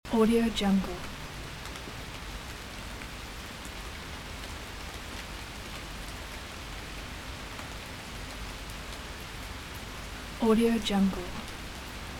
late summer rain on village loop; بارش باران در روستا; nature sounds

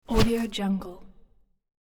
woosh crunchy short fast; transitions and movement; پایین افتادن یا ضربه

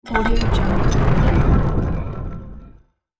magic stone spell; game sounds; طلسم سنگ جادویی

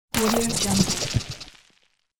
game quick burn; سوختن سریع

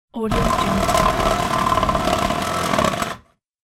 cutting hollow bricks using saber saw; industrial sounds

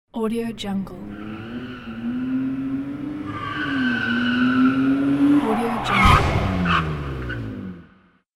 car crash; urban sounds

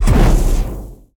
fire fireball cast short; پرتاب گلوله آتشین

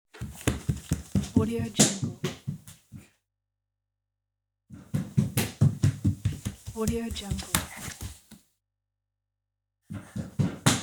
child barefooted running in house; کودک پا برهنه; human sounds

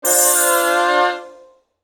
vibrant game wrong move alert; interface sounds; حرکت اشتباه در بازی

